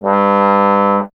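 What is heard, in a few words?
bass trombone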